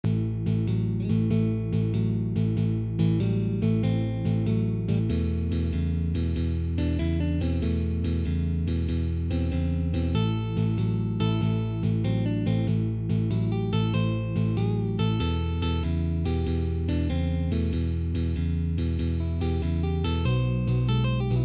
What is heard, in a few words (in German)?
Pentatonik Solo, Blues Gitarre; Pentatonik 1-2 Solo; Hier haben wir ein einfaches Blues Solo mit den Noten der ersten 2 Pentatonik Pattern